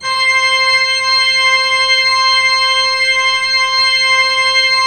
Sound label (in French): Orgue Eglise Full C6, Pipe Organ Full